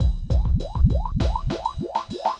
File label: drloop, drumloops, Vinyl Attack; Masterbits